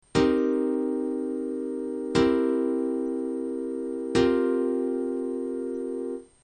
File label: Cm7 C7 C7sus4